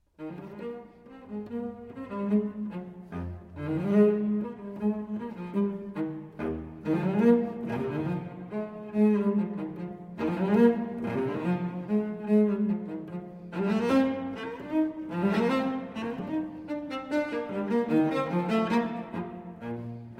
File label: Unaccompanied Cello Suite No. 4 in E-Flat Major Bourrees I & II; BWV 1010